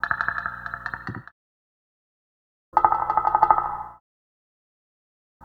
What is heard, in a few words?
Percussion; Sonic Foundry (Sony Creative Software) - Stylus Pressure Urban Grooves on Digital Wax; Matrix Z